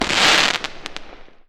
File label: crackle, firework